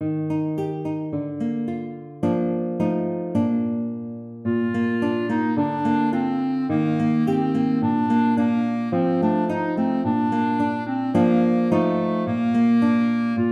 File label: deck the halls guitar clarinet